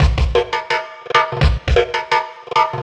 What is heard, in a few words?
Mixed Percussion, percussion loops; KJ Sawka; Drum n Bass & Beyond; Drum Tool Box